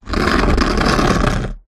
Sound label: sport skateboard scrape on hard ground